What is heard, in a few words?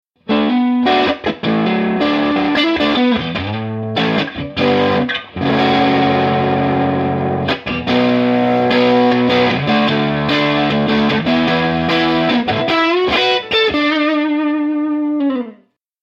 overdriven; neo12-100